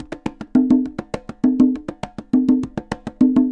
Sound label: Congas, Festa Latina, Drums & Percussion